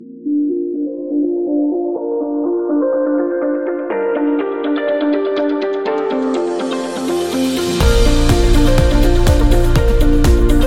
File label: Thinking